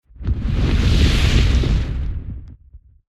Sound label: fire